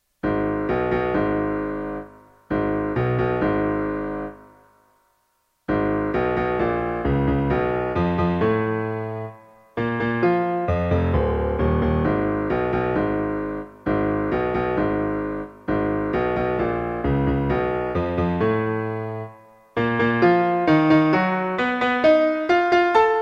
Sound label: piano sonata